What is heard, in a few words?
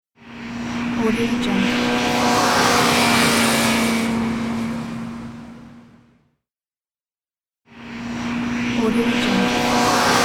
motorcycle driving by on highway